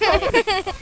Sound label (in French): echec; MOBICLIC41